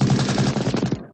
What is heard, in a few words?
machine gun sound; sfx